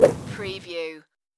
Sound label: Golf Air Swing